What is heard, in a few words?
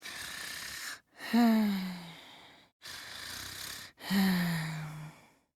sleep; kokichi